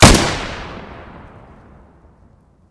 Mosin, guns fire; Weapons; civ14

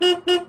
carhorn

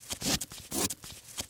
rope start, handcuffs

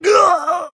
scream, Screams

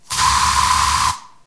spray

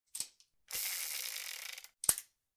revolver spin; Guns Interact

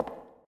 wood, Footsteps

